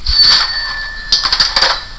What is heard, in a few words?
Cash Register